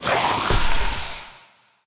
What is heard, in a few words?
enemy spawn; bloom minigame